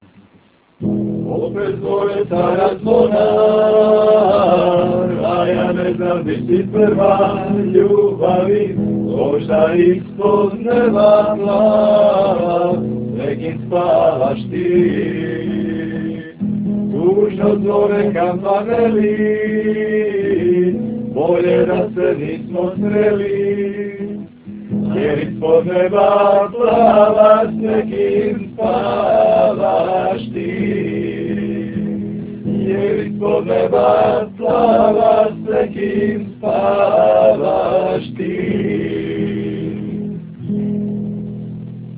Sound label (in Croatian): zvona low